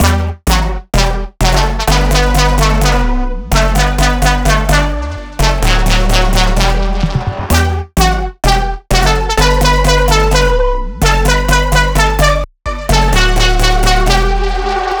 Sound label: 128 BPM E Min, House, Drop Loops; Volt; Cymatics